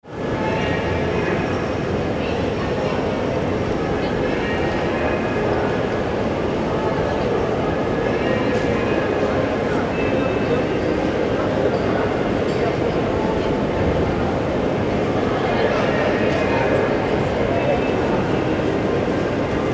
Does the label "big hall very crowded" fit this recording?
Yes